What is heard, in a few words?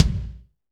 KIK, Roland; Northstar - Drumscapes Roland